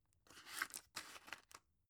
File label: drug put in soft; berufe; arzt